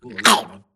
grunt death neck; Titanfall 2

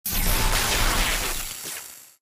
arc titan spark; Titanfall 2

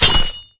Imp Pulse Blade Mud; Titanfall 2

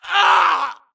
grunt death crush; Titanfall 2

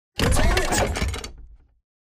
combat run to idle; Titanfall 2

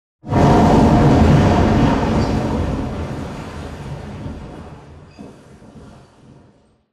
Explosion Rumble Interior Close Texture; Boomtown; Titanfall 2

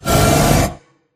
Plunger Tube Open Slide; Titanfall 2; Boomtown

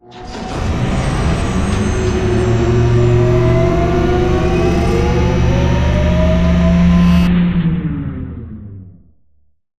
Giant Floor Panel Arrive Servo; Boomtown; Sideways Town; Titanfall 2

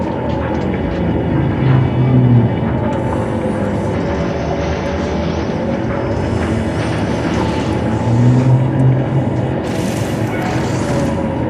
Robot Arm Track Med Mid; Boomtown; Titanfall 2

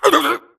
grunt electro; Titanfall 2

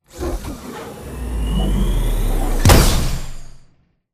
bt embark casual air grab end; Titanfall 2

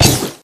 imp bullet lightballistic shieldwall; Titanfall 2

